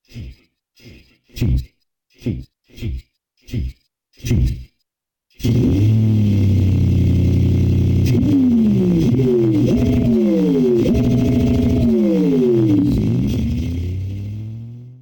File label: cheese v8